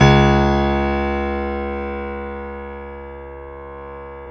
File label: PIA GRDPI, Roland